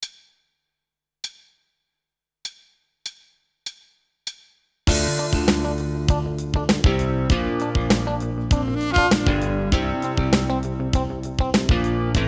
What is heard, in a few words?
Rock Funk